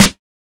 2000s sizzly snare drum sample g key, acoustic snare sound tuned to the G; snare drum samples